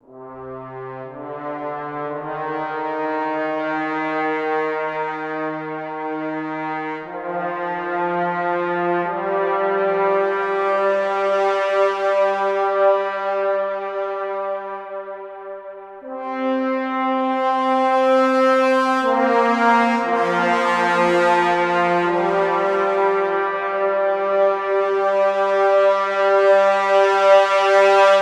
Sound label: Brass - Sombre Solo Brass - Cm 60Bpm; Ghosthack; SkyRealm